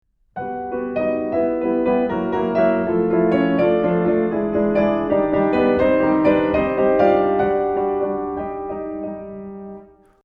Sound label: piano